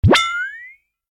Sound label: evidence hit